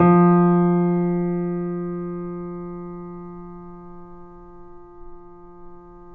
PP F2, Grand Piano; AKAI S6000 CD-ROM - Volume 2; Grand Piano 256M

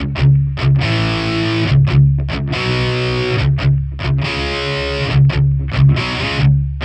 Distorted Guitar 140BPM C#min; Vengeance - Essential Clubsounds Vol.4

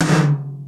GTOM, drum; Crypton - DrumBase5000; GATE KITS

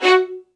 F#4, LOUD VIOLINS; Q Up Arts; Denny Jaeger Master Violin Library

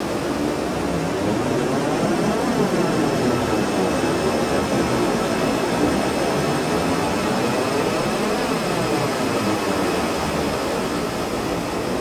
Guitar Textures

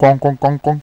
GONKONKONKON, VOCAL

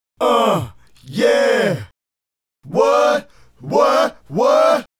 Male Group, Hip-Hop and RnB Vocals

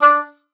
D4, OBOE; Xsample VOL-6

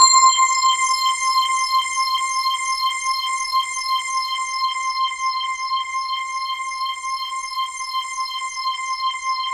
C5, Synth Line; 90 s Sample CDs; NiceBeats; SynthLine VOL-30

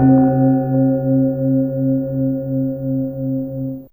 Gam 2 C2, Mallets

